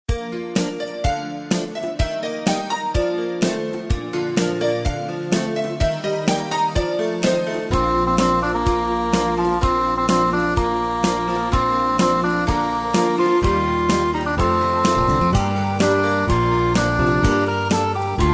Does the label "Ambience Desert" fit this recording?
No